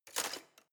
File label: knives wood drawer place